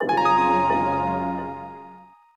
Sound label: Quiet Wake; Fujitsu Arrows-F-04H